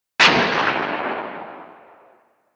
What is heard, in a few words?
Distant Western Rifle Simulation; Hollywood